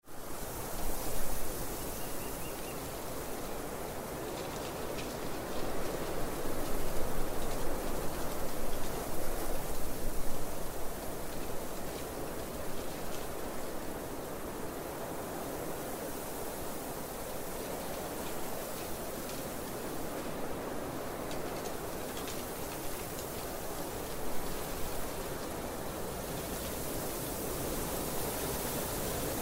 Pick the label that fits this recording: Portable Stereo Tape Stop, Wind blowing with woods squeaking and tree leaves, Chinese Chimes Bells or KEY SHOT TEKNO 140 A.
Wind blowing with woods squeaking and tree leaves